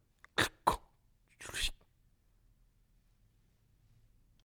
ouverture porte

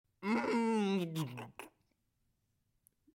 porte qui grince fermeture

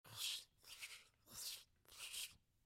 brosse; Fourniture

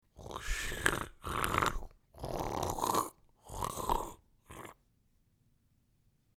tirage de parpaing; mouth-fishing

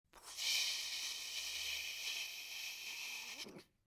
douche; Liquide

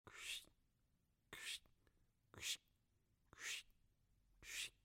brosse; Fourniture

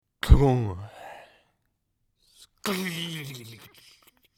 ouverture et fermeture porte issue de secours

